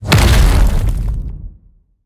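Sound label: magic, enemy sound impact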